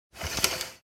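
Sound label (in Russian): шторка; Короткие звуки для монтажа — для transition, движения, смены кадра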